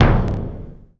BD, c4; Nintendo; July 2020 Gigaleak

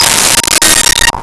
Glock Bb4; Nintendo; July 2020 Gigaleak

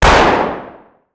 battle fire, battle rifle

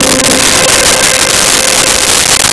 Atmosphere C5; Nintendo; July 2020 Gigaleak

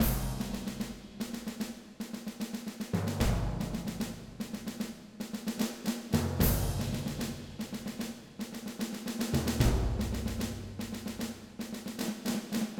perc loop

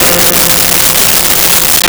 Piano F3; Nintendo; July 2020 Gigaleak

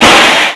crash

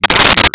geiger

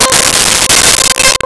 Koto C5; Nintendo; July 2020 Gigaleak

